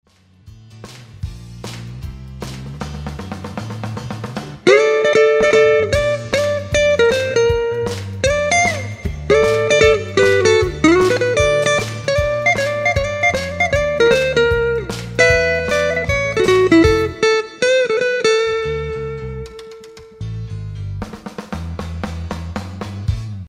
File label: Fender Strat